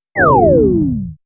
Earth div; Fly For Insanity

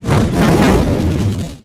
Skill fire magic; FlyForInsanity

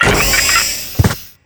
Steam Walker Idle; FlyForInsanity

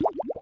spirit hurt; FlyForInsanity

